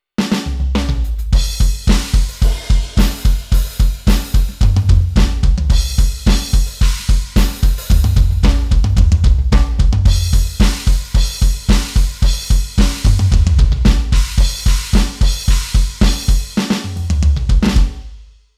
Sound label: TD1 Set